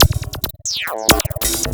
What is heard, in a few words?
percussion loops 138, Techno; PS-45